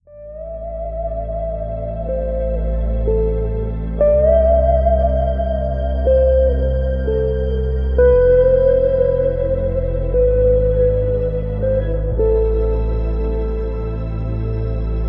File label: Relaxing Guitar Dreams; instrumentale gitarren panfloetenmusik